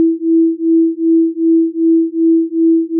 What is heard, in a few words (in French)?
battements